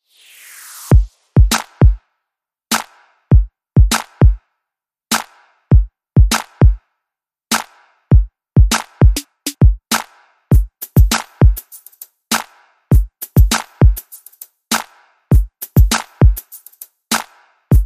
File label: Four Chords Beat